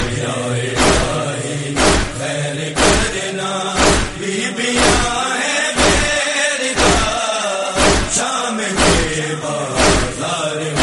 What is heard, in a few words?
noha tune ringtone